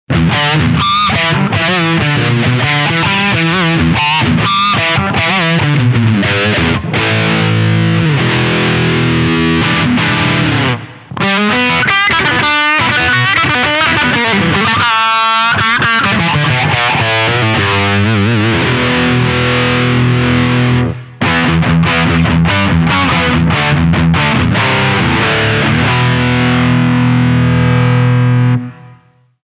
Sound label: dirty torque dirty